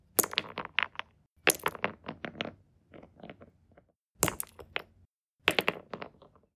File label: Stone Throwing Pebbles